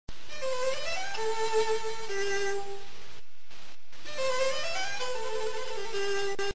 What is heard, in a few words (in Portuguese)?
com sem trinado; musica classica